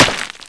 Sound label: ric, weapons; valve